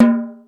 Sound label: Hip House, Drum Machines E-MU Pro-Cussion